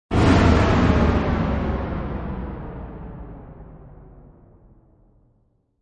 Massive Space Impact, Space crash, explosion with very heav; Space crash, explosion with very heavy reverb and phasing effect; Science Fiction Sound Effects; Lynne Music